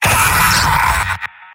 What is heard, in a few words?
soldier pain severe; vo; tf2; mvm